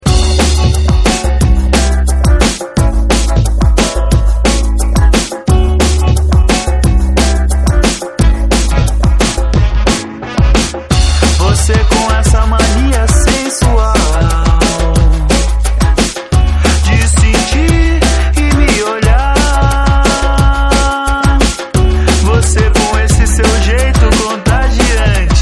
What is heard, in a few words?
electronic drumnbass; sampler electronic; brasil